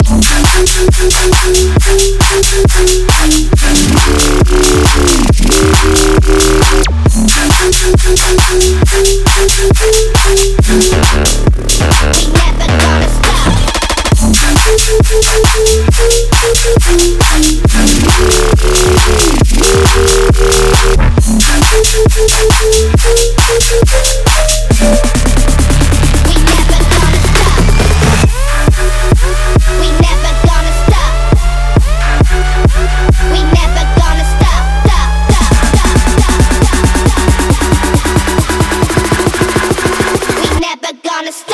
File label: Construction Kit Full Mix